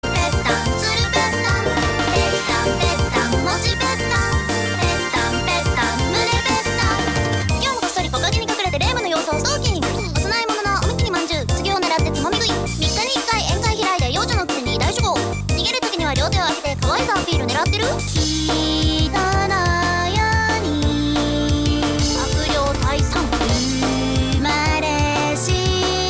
Vocal

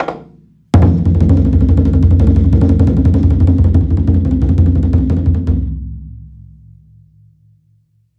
chu daiko fill roll stick; TKO

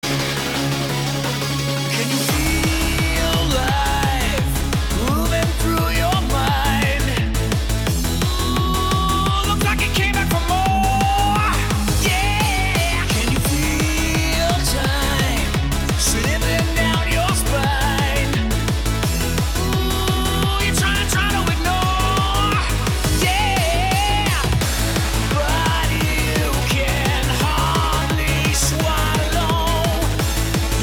eurobeat

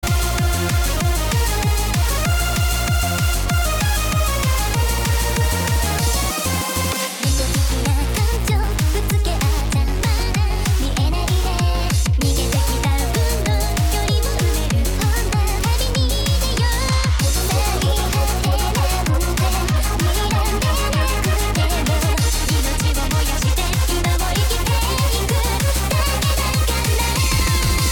Hardcore

